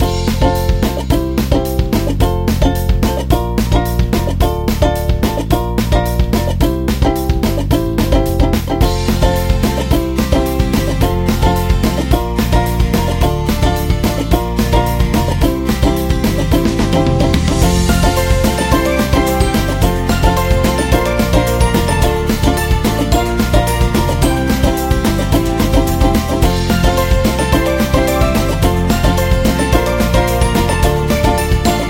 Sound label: Cheerful